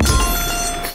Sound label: Striker Jackpot; Sevens